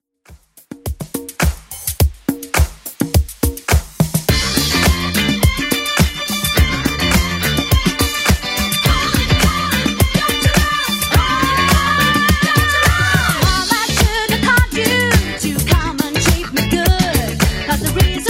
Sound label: 70s Club Edit 115bpm; remixplanet